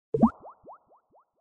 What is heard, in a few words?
game end